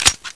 clip out, fnh; weapons

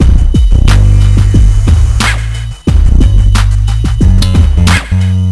urban beat; beemer

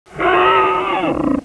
wolt attack melee; sexr

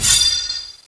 beam

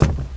wood

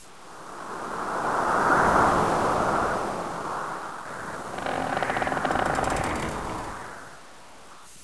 wind ambient

